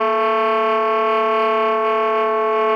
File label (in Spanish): Tremolos